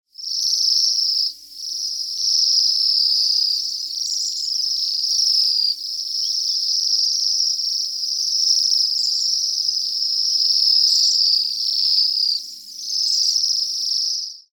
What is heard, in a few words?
Bohemian Waxwing